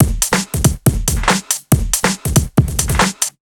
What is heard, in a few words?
Break Beats, 140 BPM